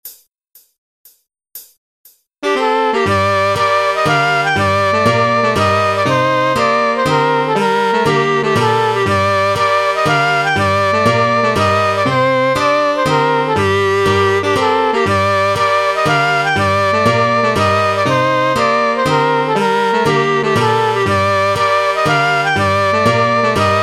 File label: Mazurka